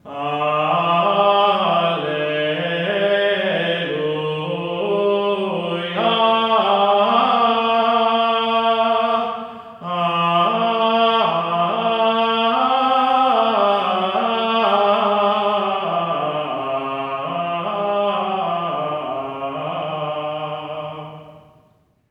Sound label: GR ALLELU, Symphony of Voices; Spectrasonics